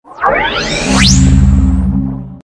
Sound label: Stinger; STINGERS